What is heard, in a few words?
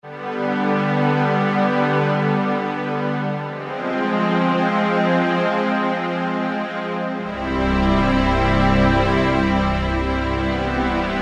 Mythical Pad; E-mu Proteus-1; Presets